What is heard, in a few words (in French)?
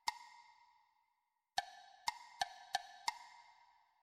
rythme